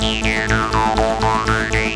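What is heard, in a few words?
Sequence Pattern, Keyboards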